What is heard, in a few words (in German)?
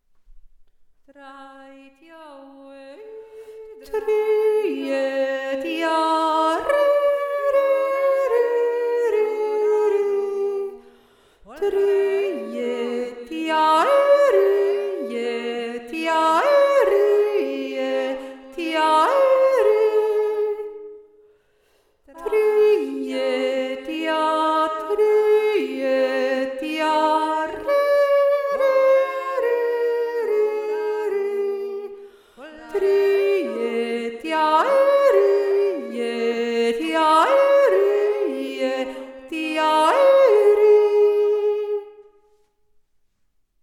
zweite Stimme, jodeln